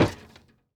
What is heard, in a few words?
Footstep Metal; Opsive; UltimateCharacterController